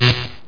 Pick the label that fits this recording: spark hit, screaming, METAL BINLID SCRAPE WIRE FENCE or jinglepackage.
spark hit